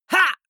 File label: male fight shout short